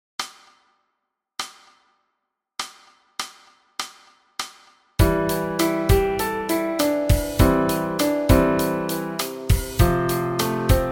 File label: Kbass C dur Song 100